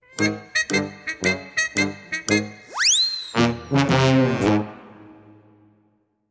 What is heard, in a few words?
game over; kill the virus